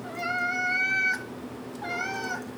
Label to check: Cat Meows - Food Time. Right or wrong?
Right